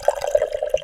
Pour; Princess & Conquest v0.20.06

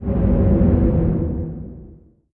shield; Drunken Robot Pornography; Inago Rage

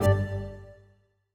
Chord, sound scheme; Longhorn 7ista Sound Scheme by David Mounder

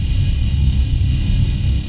rocket; Drunken Robot Pornography; Inago Rage

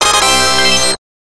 fanfare; Sonic Spinball

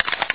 hatch; Insaniquarium; popcap

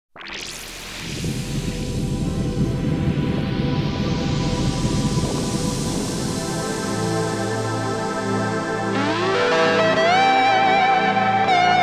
Guitar Melody; Vaporwave

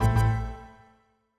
Battery Critical, sound scheme; Longhorn 7ista Sound Scheme by David Mounder

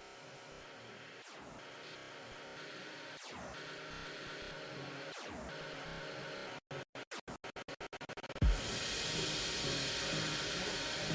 kahoot phonk remix

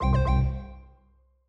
Hardware Fail; Longhorn XP Sound Scheme by David Mounder; Reincarnation of Windows XP Sounds in fake Longhorn (Samsung Theme for WinXP) style by David Mounder

